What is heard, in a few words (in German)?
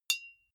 deodorant hit soft, metall; soundpool